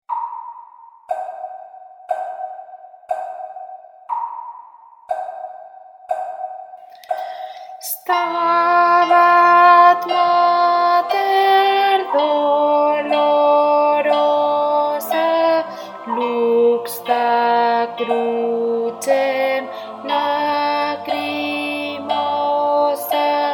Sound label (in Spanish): CONTRALTO; c.160 - El santo entierro